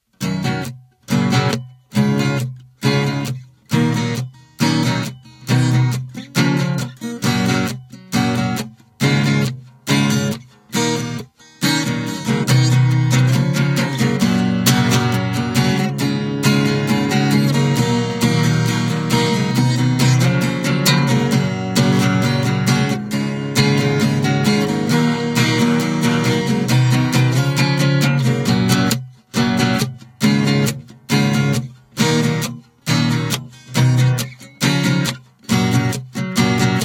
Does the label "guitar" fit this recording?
Yes